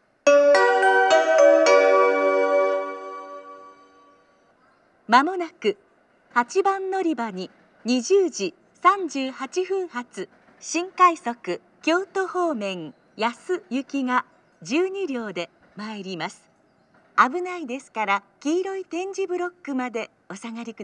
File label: special rapid service, jr-west amagasaki station; yasu